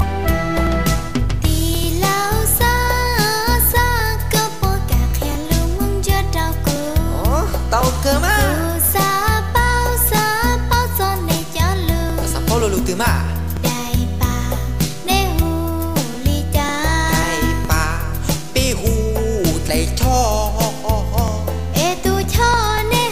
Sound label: Hmong